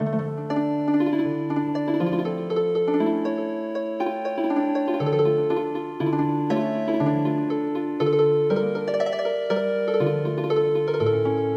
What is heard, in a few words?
Healing Harp, traditional